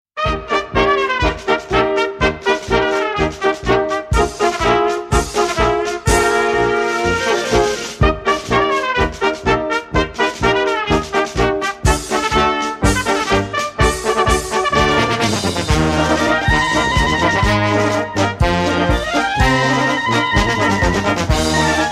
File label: circus music